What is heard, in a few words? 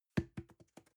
plastic can small fall bounce soft